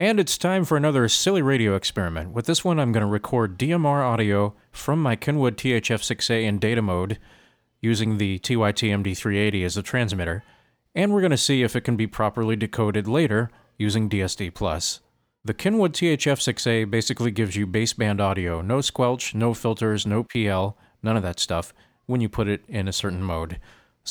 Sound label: Broken DMR Decoding